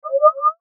Sci Fi Beep Electric, Sci Fi Beep Electronic Tone Signal; Tech; Blastwave